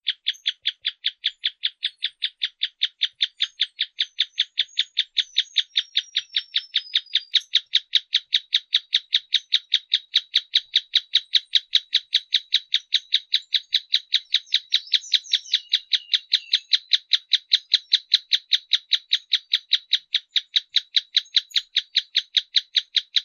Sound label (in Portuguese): Canto do tapaculo preto